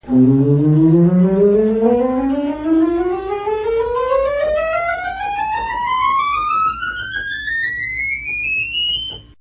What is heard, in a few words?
praestant, organ